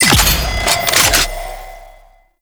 Front Line Ammo Dropoff; Tau; Twelve Minute War

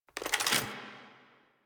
AK47 Reload Mag Raise; Warframe; Tenno; Weapons

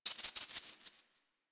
Drifter Camp Rock Critter, Creatures, Ambience; One Offs; Warframe; Quests; The New War

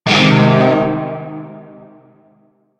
Shawzin Min Chord I; Music; Warframe; Narmer Shawzin

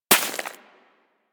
Bullet Impact Debris Snow

